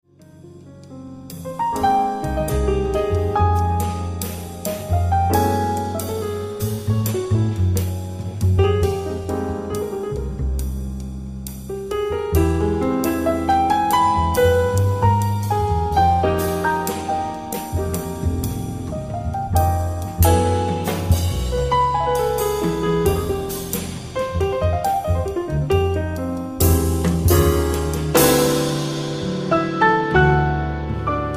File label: gentle bossa